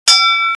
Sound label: ring